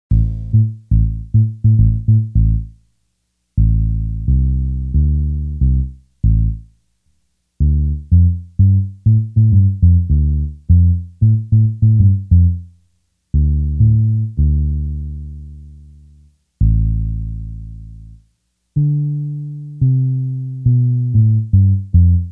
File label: univox cp 110 electric piano; dr zee workshop